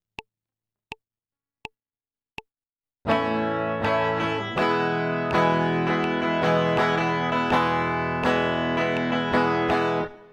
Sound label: chord change a e strumming, guitar